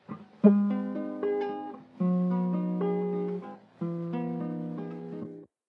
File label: reflection instr